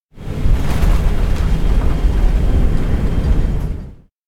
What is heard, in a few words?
plane land; anemoi